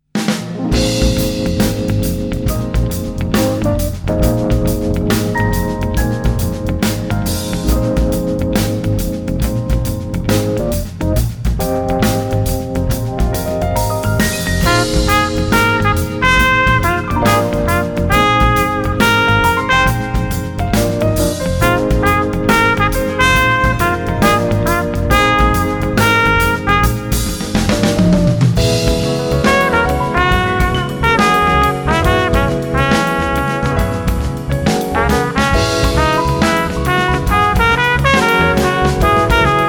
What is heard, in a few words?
Right Foot Shuffle